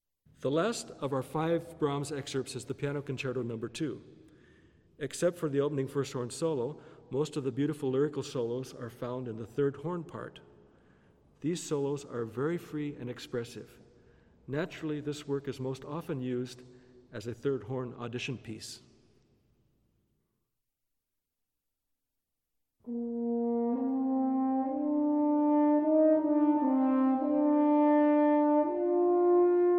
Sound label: Piano Concerto, Orchestral Excerpts For Horn